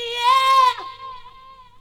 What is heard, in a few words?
VOC; Zero G; Man Machine